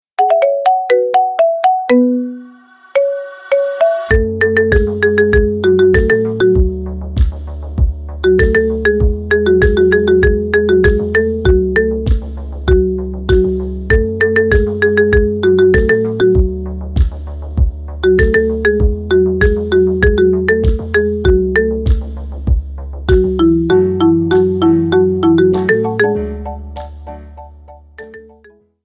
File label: marimba remix